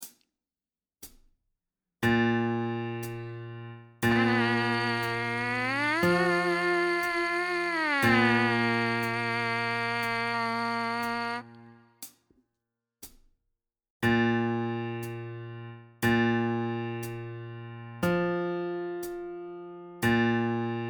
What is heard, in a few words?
Mpc buzz line